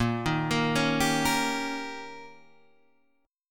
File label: D sharp 7th A sharp, guitar